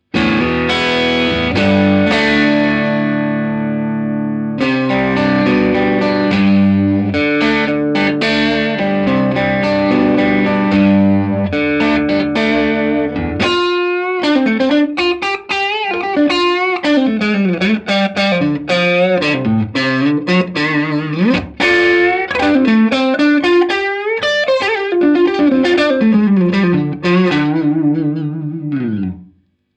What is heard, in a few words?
overwound crunch middle; schwarzbach